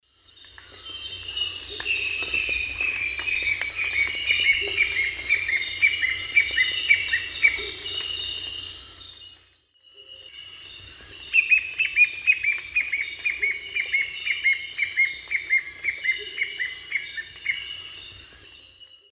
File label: Xiphocolaptes promeropirhynchus, song; S. America; Eastern Lowlands